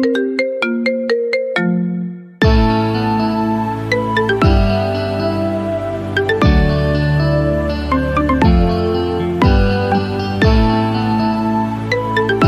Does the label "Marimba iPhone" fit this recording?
Yes